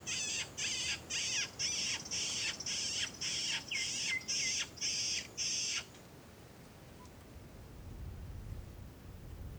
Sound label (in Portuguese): Passaro que parece bebe chorando; aves; em terra ronca; Coleção Sonora do Cerrado